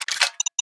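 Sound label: weapon mine arm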